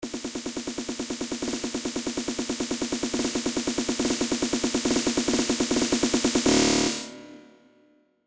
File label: snare build